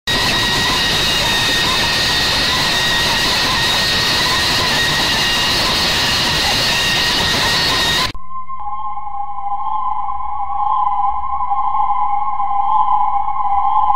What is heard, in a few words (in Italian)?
suoni horror migliori suoni paurosi per halloween effetti sonori; bhoot sound creepy sound